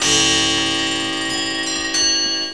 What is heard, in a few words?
Chime